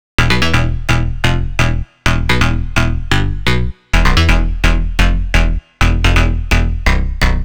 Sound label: Bass Loop 128 E; Ghosthack; Ricochet